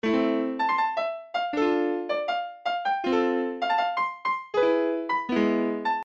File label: Mellow Piano Melody Am Dm F E